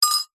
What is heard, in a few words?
cutlery item set down into ceramic bowl; household